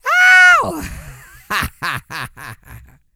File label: YELL, MALE HUMAN; Spectrasonics Vocal Planet